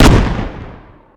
Shotgun Shot, Weapons

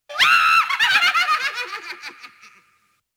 Witches Cackle; Halloween Havoc - Sound Effects; Ktel

